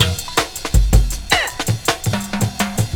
breaks 162

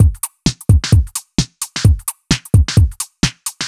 Beat 130bpm, uk garage

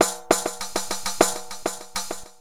Baladi Riq, Acoustic Beats; Sonic Foundry (Sony Creative Software); Myths of Technology

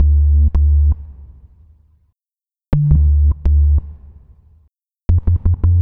bass loop 165bpm Dm, Drum&Bass; Total Drum&Bass

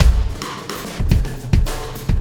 Sky Lab Beat, Processed Drumkits

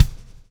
lash, backbeat

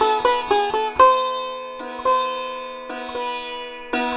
SITAR, Indian Traditions

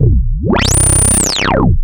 OSCAR C1, Rare Synthesizer; Hollywood Edge - Giorgio Moroder Rare Synthesizer Collection

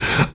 MALE SOLO, MALE NOISE, Heart of Africa

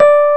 RH, Rhodes; Greytsounds; CLASS RHODES

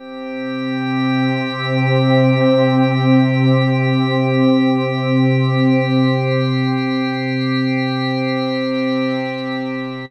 Pad; Dream Zone

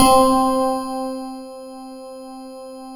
keys; DCS KEYS

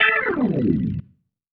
Slide, Organ, Loops

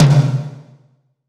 Big Conga

